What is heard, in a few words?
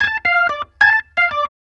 Organ Lick; Ambient Grooves; Organs Licks